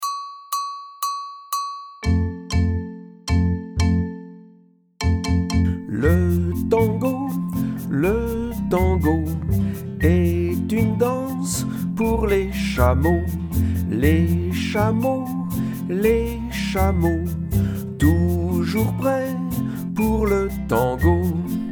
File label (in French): le tango chant; solfege; chansons rythmiques